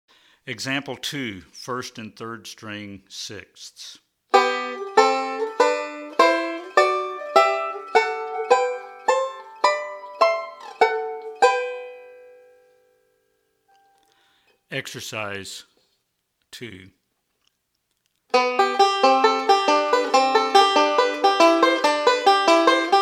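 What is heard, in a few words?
Sixths On The 1st And 3rd String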